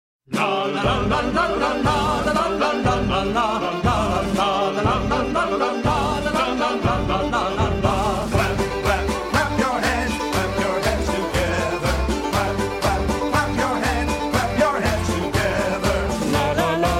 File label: Clap Clap Clap Your Hands